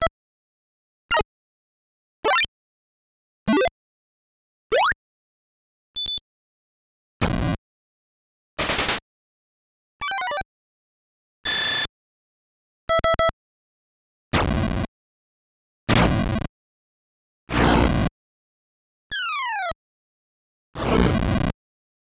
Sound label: tank sound effects; great tank; звуки и звуковые эффекты из игры